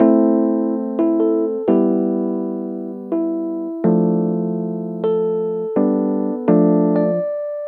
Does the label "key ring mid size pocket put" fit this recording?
No